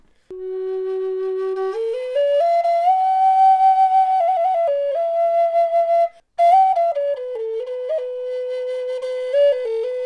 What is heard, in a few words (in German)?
Hoerprobe F Floete Zeder Nuss rauchiger Klang